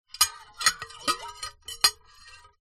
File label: household knife scrape around empty plate; zapsplat